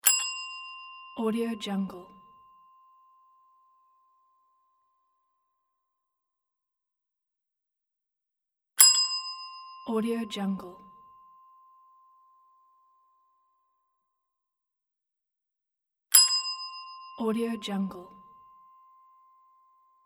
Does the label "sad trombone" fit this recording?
No